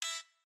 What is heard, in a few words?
Turret Button